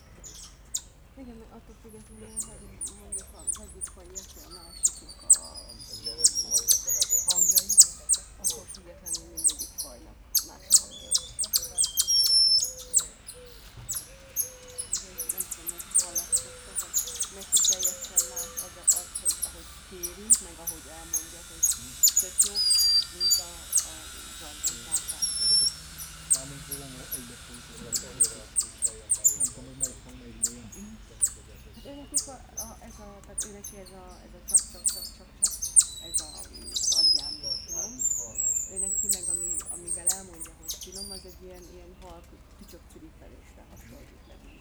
halk háttérbeszélgetés, aranyfejű oroszlánmajom; szegedi zoo 2011

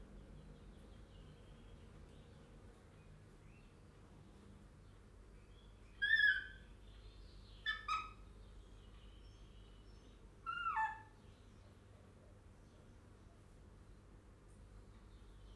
a végére csak megjön a hangja, fehérbóbitás kakadu; jaszberenyi zoo 2015

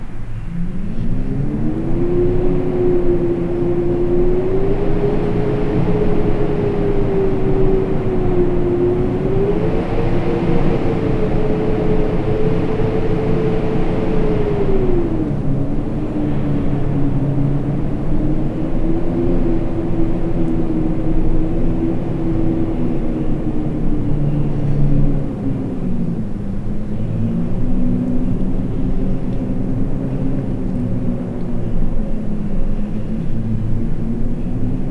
ajto mogul, szel; angyalfold

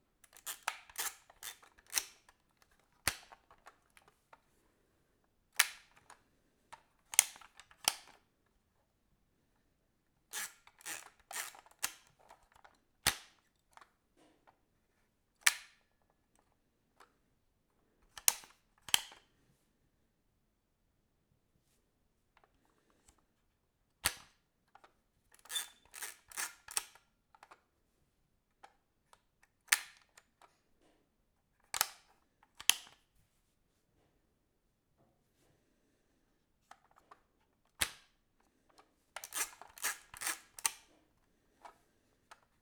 perkupa; fényképezőgép manuális

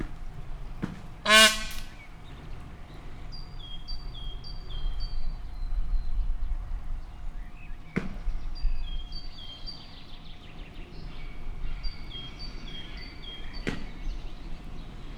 egyszer bejött, páva; pecsi zoo 2017

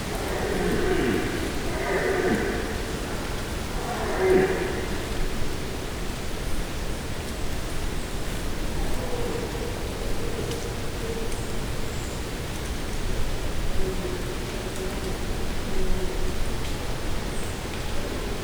zorgo agak mely volgyben bogo bikak, gimszarvas termeszetben

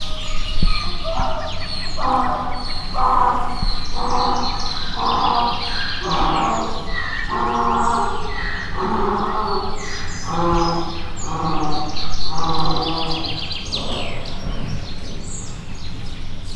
azsiai felvadszamar; miskolci zoo 2018

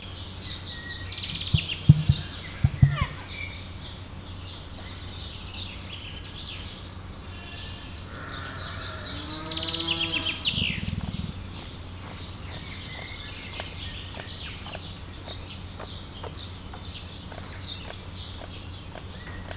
doboles fa oszlopot csipeget, emu; miskolc zoo

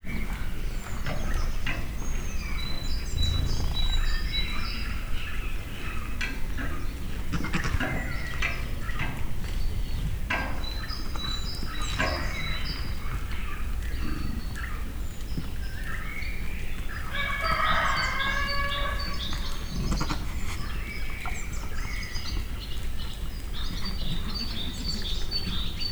haztaji istallobol madarcsicserges fecske kakas; állathangok; miskolci zoo 2009; paraszt udvar

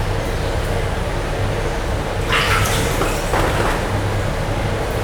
kis pingvinek, szárnyát rázza, kívülről mesterséges vízesés hangja; jaszberenyi zoo 2015

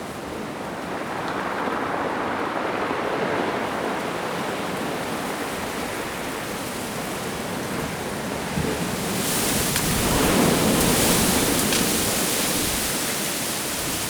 ocean gordulokoveknadas

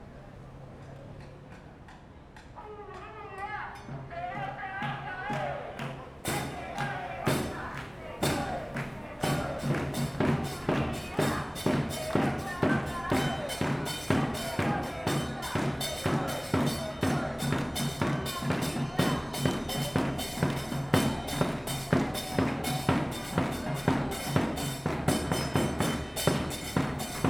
tüntetés utca

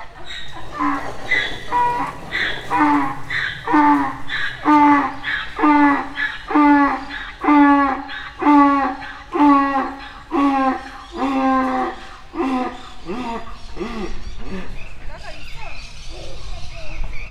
távolról, anyuka gyermekével, magyar parlagi szamár; debreceni zoo 2012

